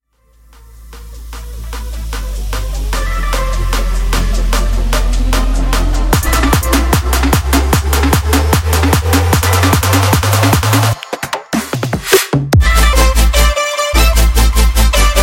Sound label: Melodic EDM; Cymatics; Signature Series EDM